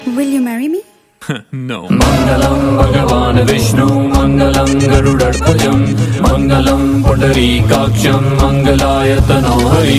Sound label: Vivah Mantra